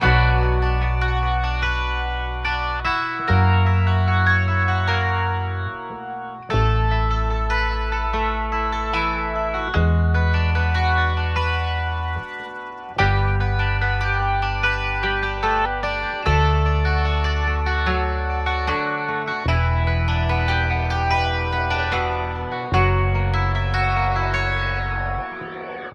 guitar, Loops, Sad

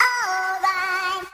"oh boy", cartoon SUNG

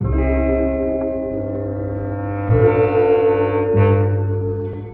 guitar and oboe, Loops Sad

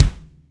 Kicks Loud

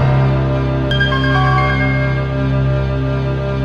keys, Loops, Sad

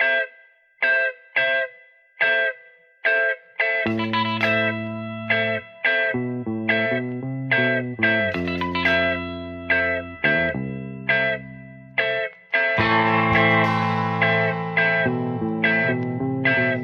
guitar, Phonky Loops

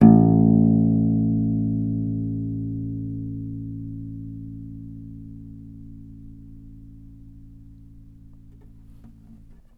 fingertap, Bass

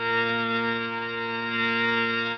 organy, synths; Notes

